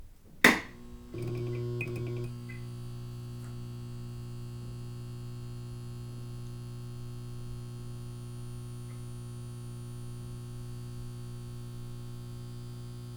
Fluorescent Light Switch On Off Electric Hum Prickly Close